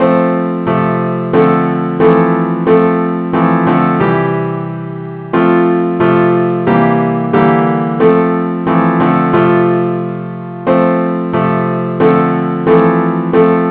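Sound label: advance accompaniment, key f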